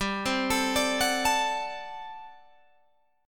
G Major 7th Suspended 2nd Suspended 4th G down Guitar Open G